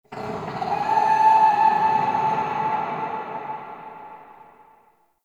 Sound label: Growly Overtone, Ambience; Warframe; Shadowgrapher